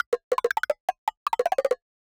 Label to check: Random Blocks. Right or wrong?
Right